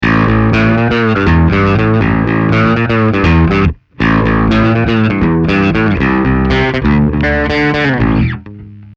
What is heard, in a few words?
Trace Elliot AH600; Boss GT-10B